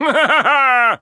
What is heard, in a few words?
Wizard Laugh